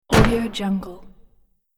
world builder stone hit

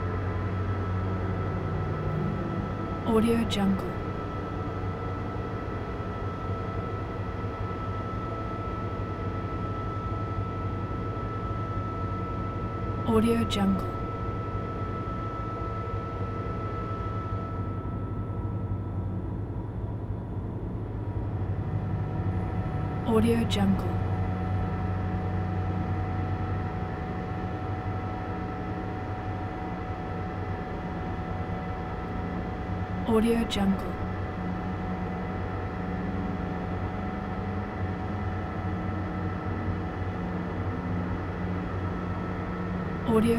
metro train

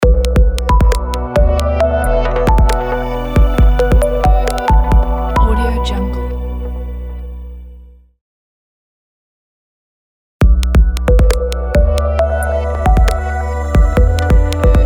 abstract clean logo

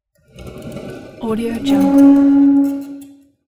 moving chair

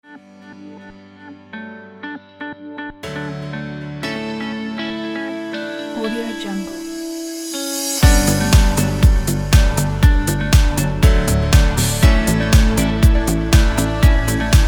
modern pop; sergeynov

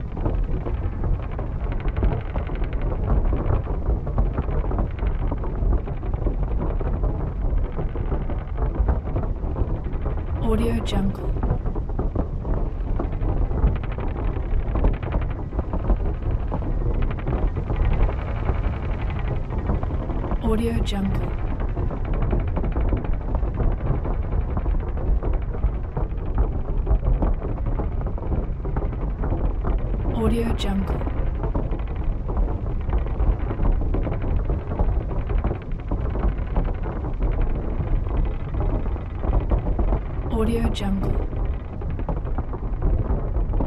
scifi spaceship hull mechanical stress loop

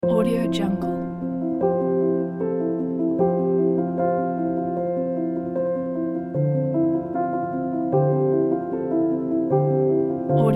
emotional inspiring pack; music-waves-production

